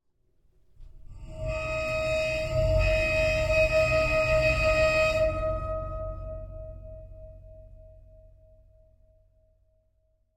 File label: sound sculpture hanger; fvtt sonniss gdc audio